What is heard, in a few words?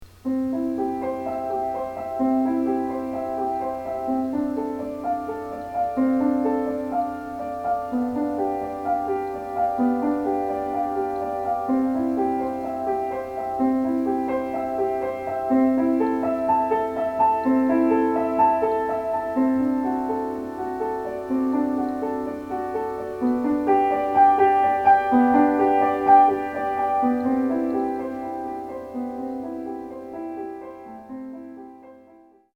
Prelude in C Piano